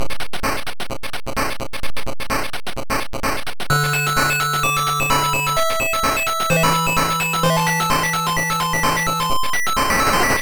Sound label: PSG, master system; Thunder Blade; Type II